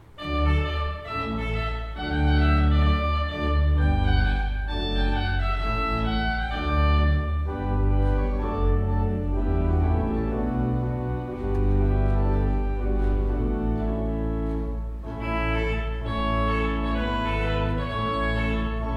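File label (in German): Orgel, pfeifenorgeln; Conacher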